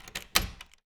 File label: Foley Door Lock